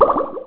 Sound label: click